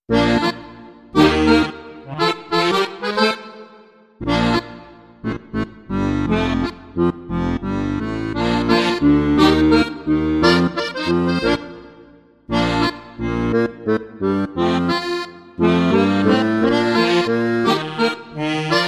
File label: Bavarian Calypso, No Beat No Steeldrums